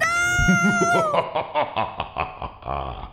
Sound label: Nooo evil laugh